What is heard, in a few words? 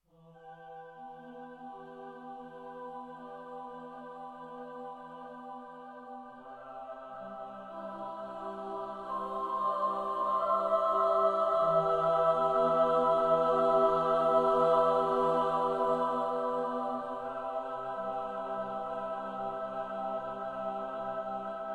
Choir